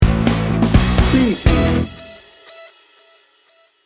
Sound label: reflective and hopeful sting bumper